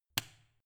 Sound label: switch turn off hard